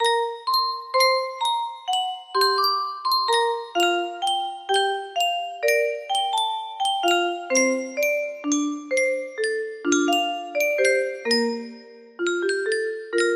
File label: music box, music box melody